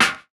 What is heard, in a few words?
SNARE; E-Lab; X Static Goldmine 2; akai